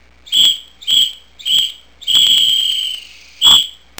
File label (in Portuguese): Apito tropa